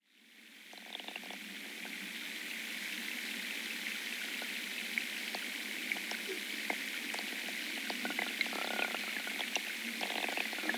Underwater Sounds At Tone Canal; SUGAI KEN; Tone River

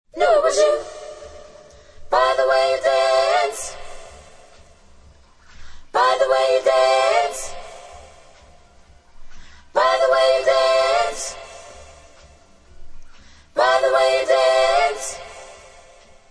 original background vocals acappella

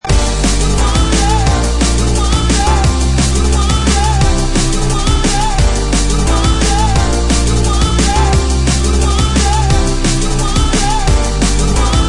Piano Anthem; Sonic

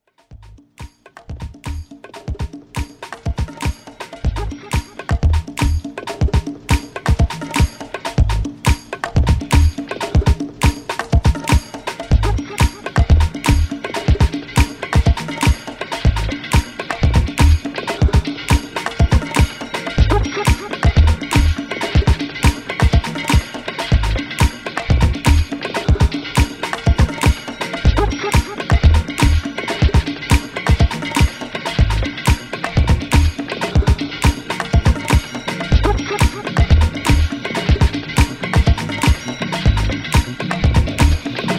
Drum Sounds

